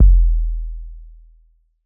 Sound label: kick machine